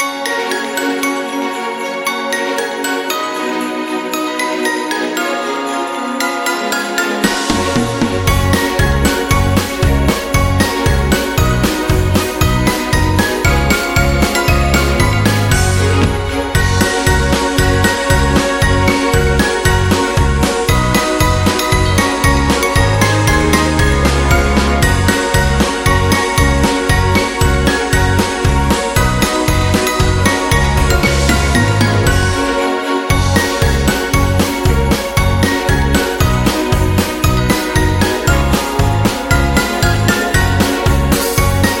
Jingle Bells

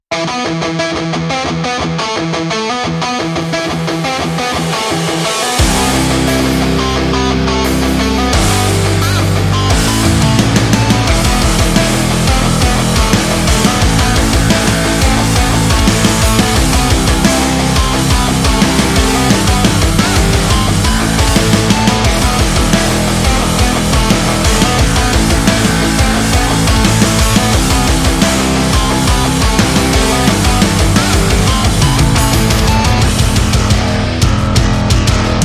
Driving Metal Background; action metal and rock music

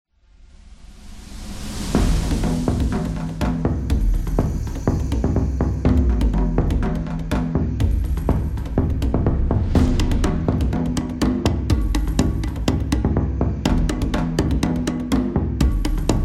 African Beat

